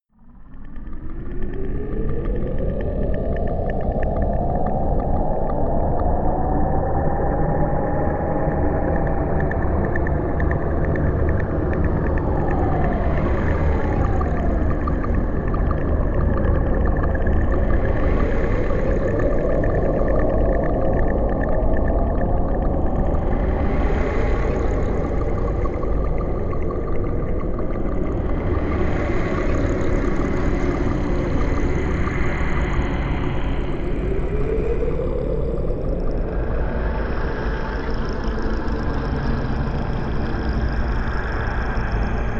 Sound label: atmosphere creep